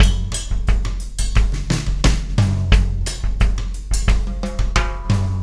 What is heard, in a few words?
Beat, Loops